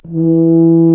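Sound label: brass tuba; Roland L-CDX-03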